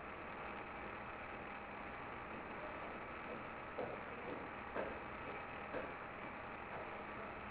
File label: footsteps